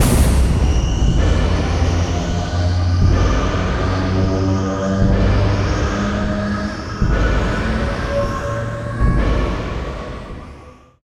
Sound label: Alarm Trigger Stinger